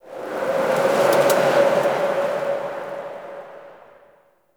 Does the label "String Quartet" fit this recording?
No